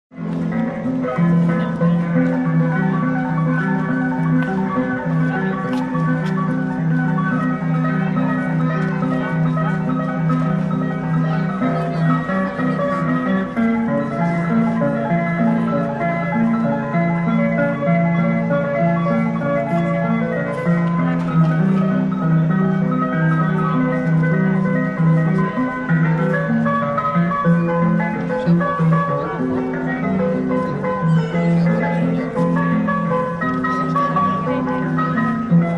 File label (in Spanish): calles arpista musicos en la peatonal; cordoba y s m